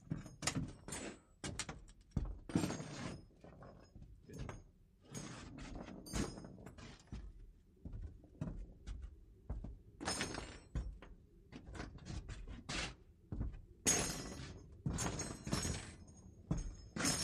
Shell Casings: .50 Caliber Shell Casings Dropped And Kicked Around Pbr Deck. Dual